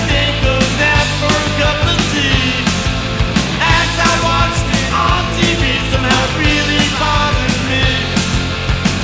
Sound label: music; wu xing; earth